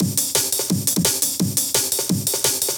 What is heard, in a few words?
Up Jump, Loops, breaks; m8 breaks